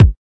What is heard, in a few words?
kik